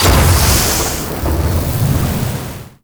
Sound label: Void Dragon Pillar Ground Fire Start; Enemies; Abilities; Warframe; Duviri